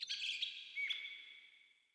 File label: terrain Ambient birds